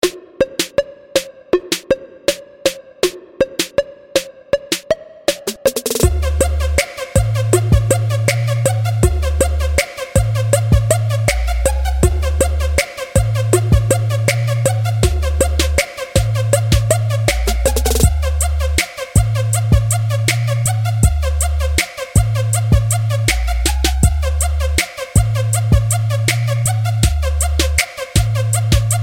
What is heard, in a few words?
Hit Trap